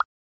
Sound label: player join